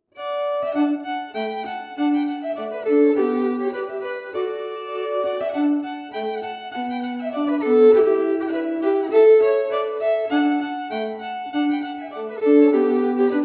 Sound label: Old Parnell Reel harmony focused